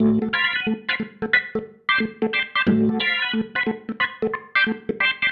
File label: Pattern, Organ